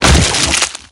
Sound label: Mob Attack Trigger; Mob Rygot